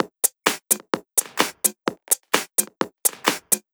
Loop, 128 BPM

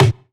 Toms

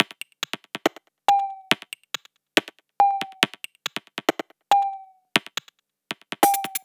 Bpm140 Perc Loop, Dubstep; Blue

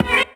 B STAB, funky

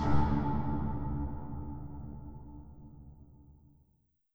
FX Impact

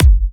Bassdrum; Mutekki Media; Vengeance Electroshock Vol.2

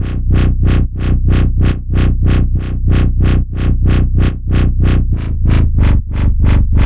140 B BASSLINE, Dubstep; Loopmasters; The New Sound Of Dubstep; BASS LOOPS

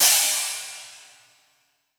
Crash, Cymbals

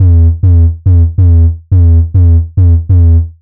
140 E BASSLINE, Dubstep; Loopmasters; The New Sound Of Dubstep; BASS LOOPS

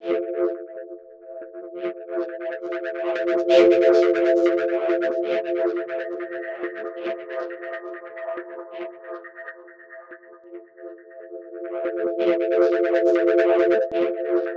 Bpm138 Synth Loop Fmaj, Dubstep; Airy; Observer; Prime Loops; Dubstep Illusions

